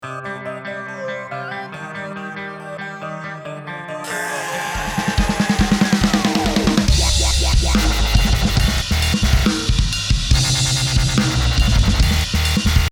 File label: intro transition